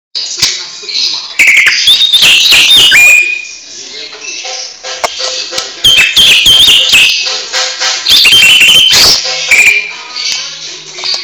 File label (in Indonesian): cucak ijo receh; masteran